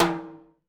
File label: Mid W Verb, Alesis D4 Drum Machines